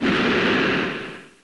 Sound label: Fire; Pokemon Essentials; Pokemon Iberia V1.03